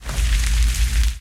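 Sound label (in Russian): трескучий умеренный огонь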